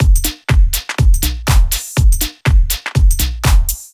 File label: 122 Drum Loop